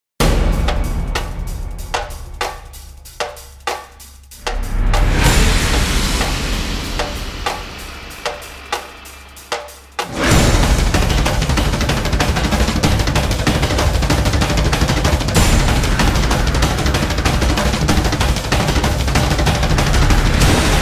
Percussion

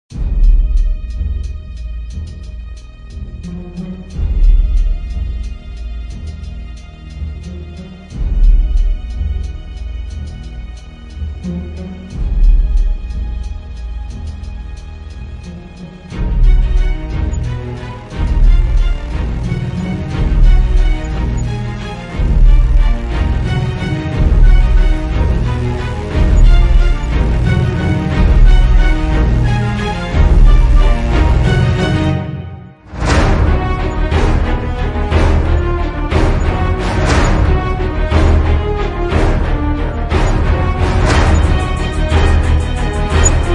No Vocals

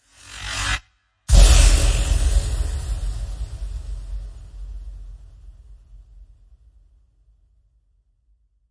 Impacts Abstract Explosion; Gothic Storm Music; Advanced Sound Design 2012

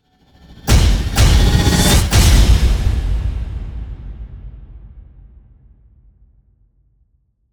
Impacts Combo; Gothic Storm Music; Advanced Sound Design 2012

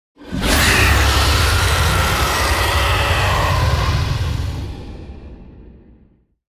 Beastly Scream; Ninja Tracks

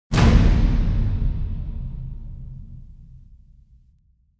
Hell Spawn Hit; Gothic Storm Music

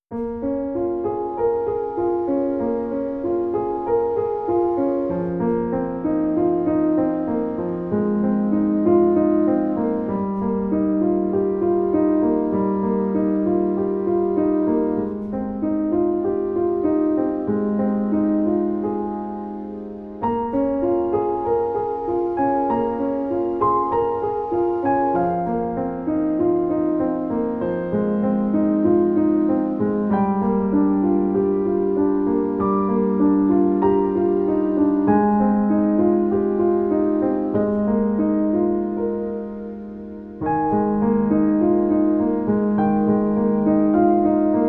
Piano, Music of China

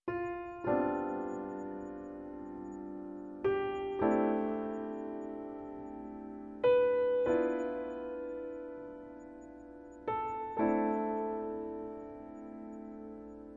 Solo Piano

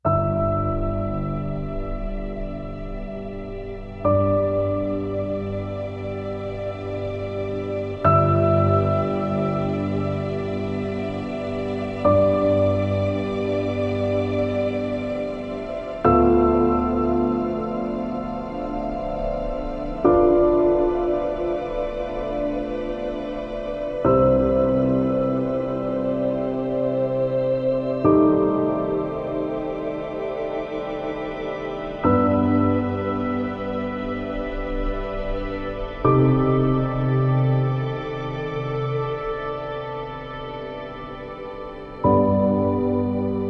Ambient

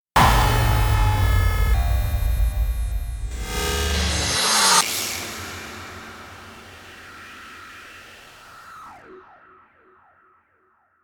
Glitch Twist Tonal; Ninja Tracks

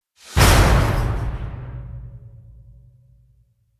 Hit Swell; Ninja Tracks

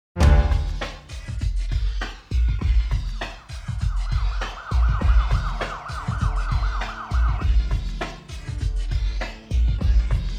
Techno Drama

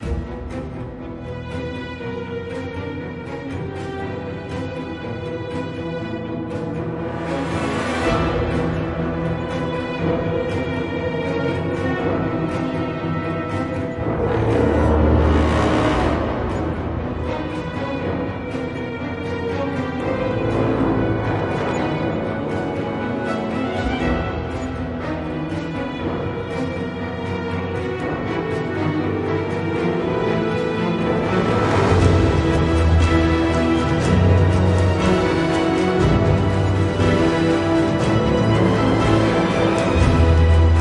Fury Ambient

